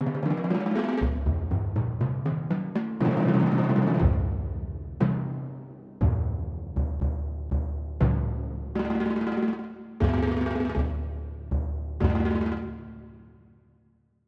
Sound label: All Drums